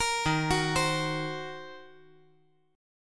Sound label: D sharp Minor 6th D sharp down; arpeggiated; JGuitar